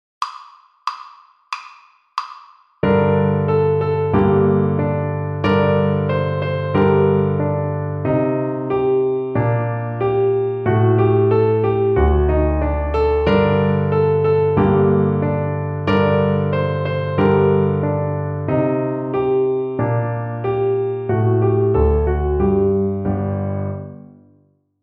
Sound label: Piano Accompaniments